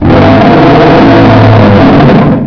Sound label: Dragon Growl; Playable Tutorial Level; TR2